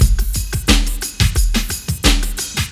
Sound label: house n funk; Sonic Foundry (Sony Creative Software); Ugly Remnants VOL-2